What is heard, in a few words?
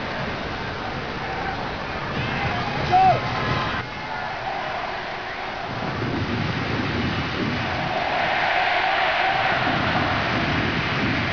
crowd, football